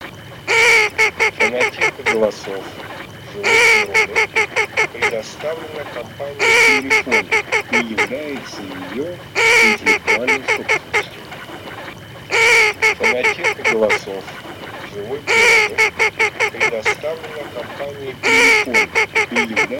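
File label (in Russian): Широконоска Mestolone, манок; plurifon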